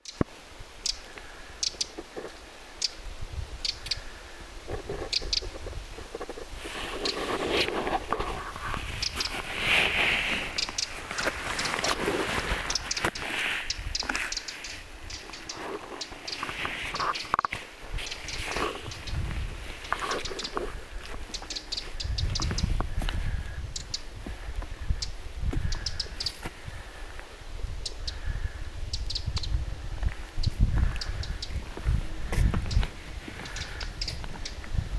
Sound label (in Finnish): peukaloinen vanhan lintutornin luona